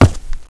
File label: concrete; valve